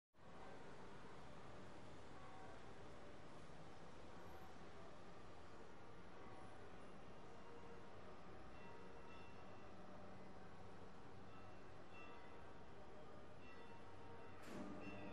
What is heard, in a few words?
Duomo Cathedral Flo, bell towers